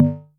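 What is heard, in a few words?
Analog Low, Electric Tom, Yamaha DTXpress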